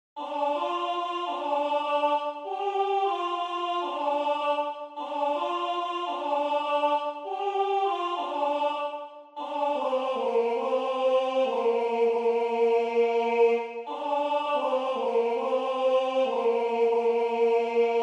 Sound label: MIDI, S(S)ATB(B) 2 Leads a cappella